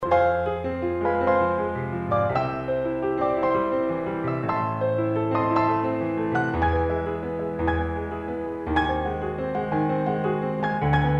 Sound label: piano